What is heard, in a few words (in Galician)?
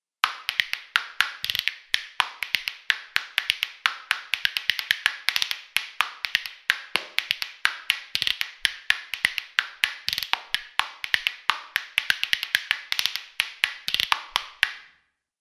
culleres